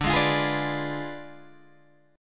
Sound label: D Diminished 7th, D, down, Guitar Open G